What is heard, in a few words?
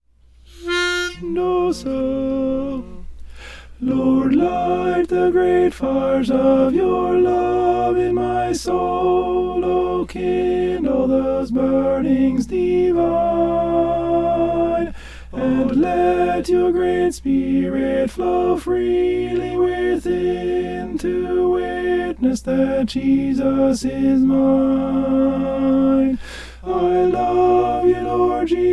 alto; i love you lord jesus